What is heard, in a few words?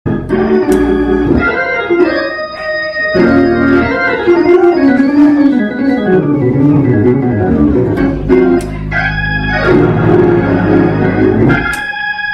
organ shout